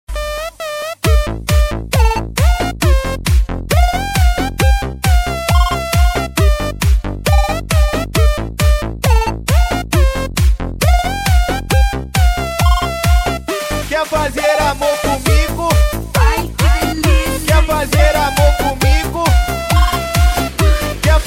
dj mix

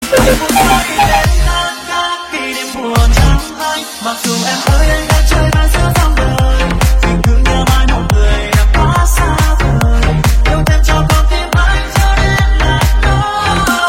Remix

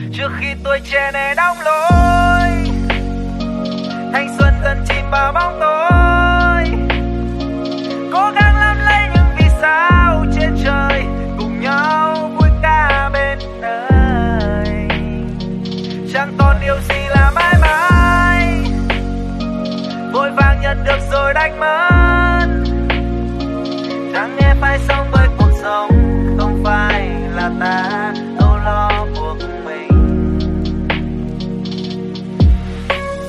Lofi

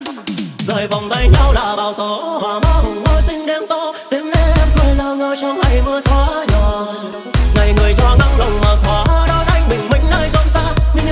Remix

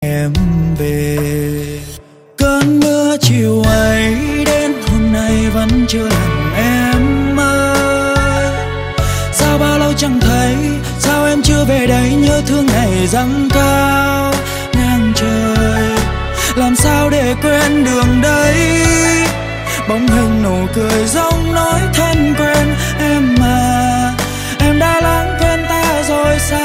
Remix

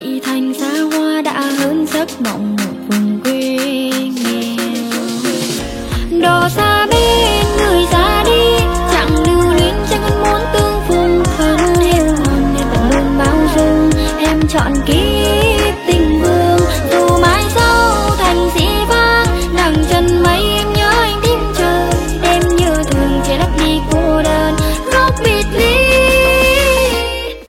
Remix Hot TikTok

